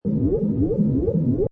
Space Scifi Sound The Lab, Sci-fi ambient atmosphere; Pulsating hum. Spaceship, spacecraft, space station